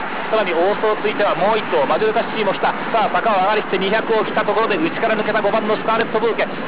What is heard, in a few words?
goal